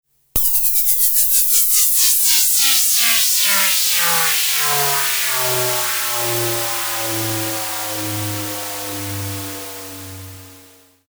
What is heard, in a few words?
matrixbrute2 fx